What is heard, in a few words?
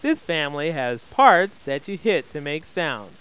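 percussion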